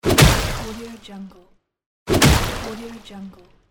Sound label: water kick; game; افتادن جسم سنگین